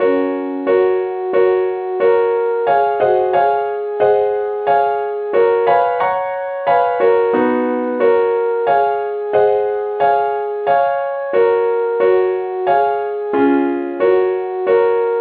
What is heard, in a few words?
leadsheet key fis; SAINTTHOMAS